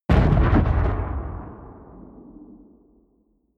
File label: bomb rumble distant